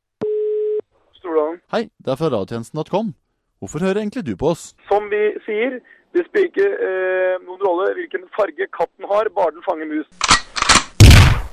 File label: JINGLE; Stordalens katt